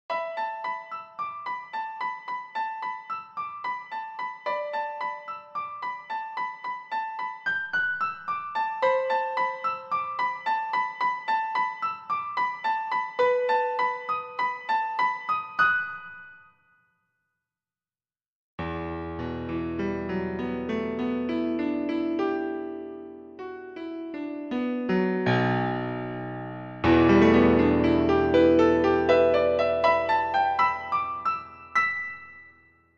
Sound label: piano